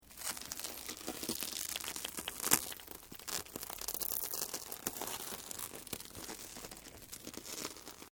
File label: GLASS LAMINATED TWISTING; Material components for constructing more complex sounds; Glass (Sound Effects:Materials)